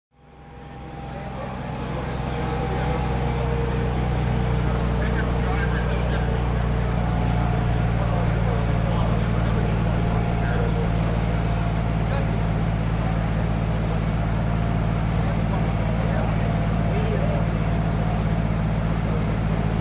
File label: Hydroplane Dock Amb